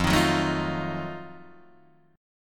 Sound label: F 7th Flat 9th, Guitar Open D